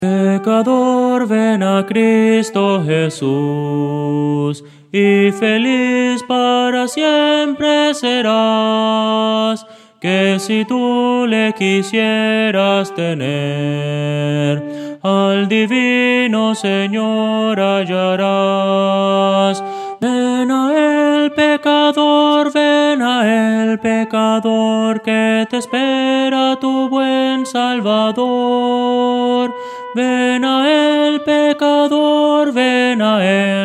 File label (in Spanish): soprano